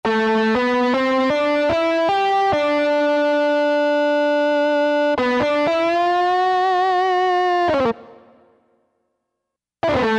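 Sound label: warm od, guitar; Factory Patches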